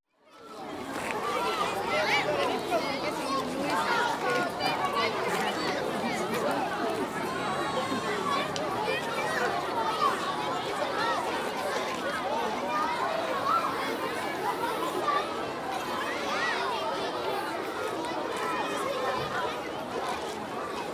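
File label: Crowd Children, Exterior Atmosphere Ambience With People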